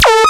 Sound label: noise, FX & ATMO, ALARMS & ALERTS; Techno Trance Essentials